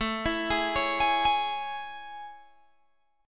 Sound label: A Major 7th A, Guitar, Open G